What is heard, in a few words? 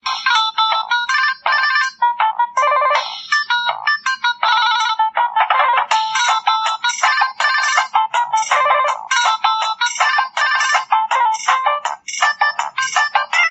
song, nokia phone